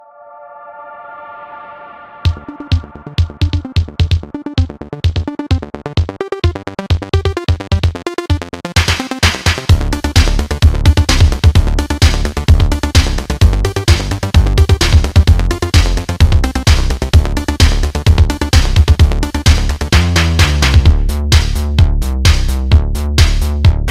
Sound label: Trailer Music